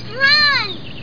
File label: run; Gump